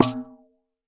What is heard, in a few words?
Processed Ethn Perc, Doru Malaia Percussion; ETHNIC and WORLD PERCUSSION